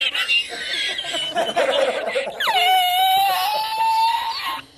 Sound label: mexican laugh short